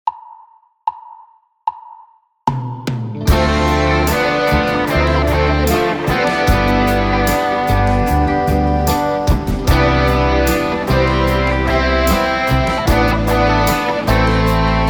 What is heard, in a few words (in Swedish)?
2 gitarrer